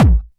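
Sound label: hard bass drums; Bazzdrumz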